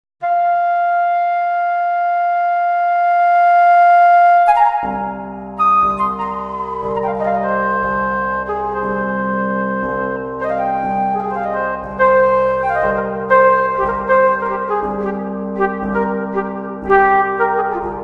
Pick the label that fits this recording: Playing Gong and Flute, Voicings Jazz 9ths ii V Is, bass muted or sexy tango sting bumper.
Playing Gong and Flute